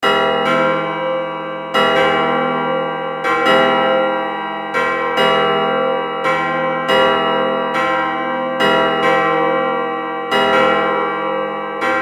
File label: chapel bell